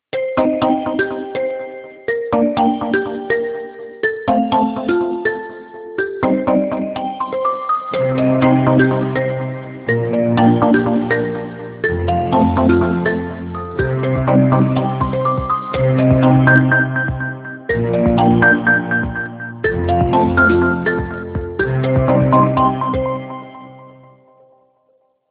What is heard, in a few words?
MIDI; Okapia; Signature L